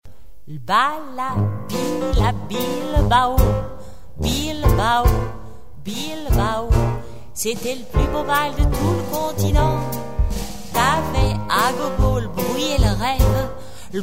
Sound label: tuba song